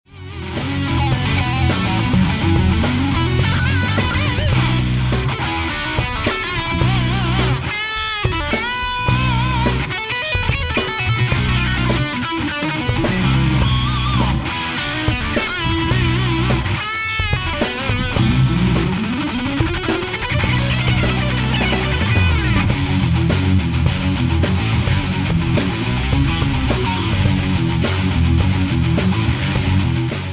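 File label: rock song, guitar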